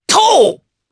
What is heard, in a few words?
Vox Jump, jp; voices; heroes; Oddy; kingsraid